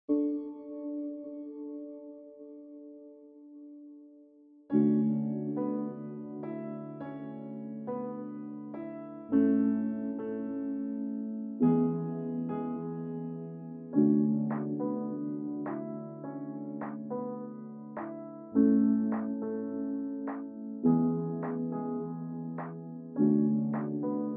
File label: Instrumental